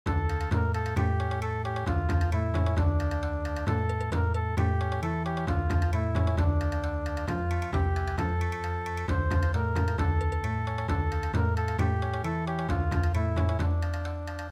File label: Bon Odori